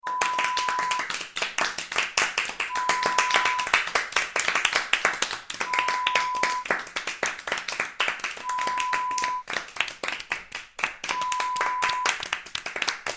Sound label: Applause small crowd sound